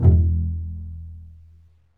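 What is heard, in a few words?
STRINGS, Cbs Pizz; Roland L-CD702; Cbs FX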